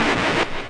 Electro FX; Ueb HE Electro FX

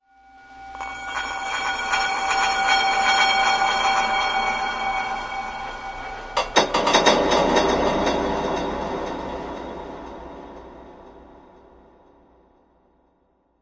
bowls reverb clanging; Bells

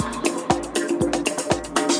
119 bpm, flange the conga

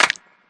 clap; Claps

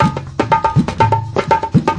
tabla rhythm, drum loops and breaks

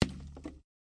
snowball glass, Throwing Snowballs; UNUSUAL KITS and FX

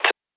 hat blip; percussive; REMIXABLE COLLECTIONS; Ze Franks Pain Pack; pain pack percussive and bips and clicks

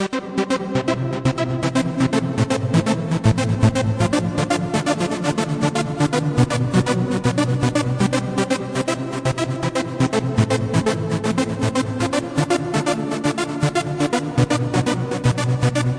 120 trance synth line; SYNTH AND ELECTRONIC LOOPS

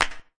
real verb real; clap; Claps

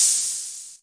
hh op, Korg ER-1

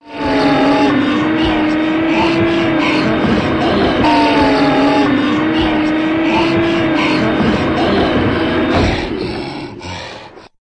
noisy hyperventilating; Various Strange Sounds and Effects

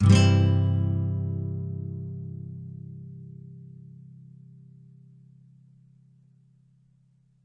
Nylon Chord C maj; Nylon Guitar Chords

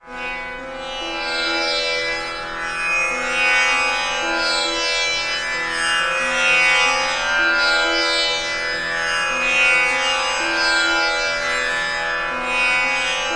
Bhupal Tori; Far East Drones and Plucks

